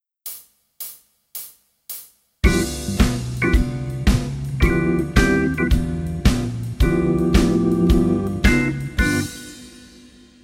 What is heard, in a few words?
HORNS Play Along BLUES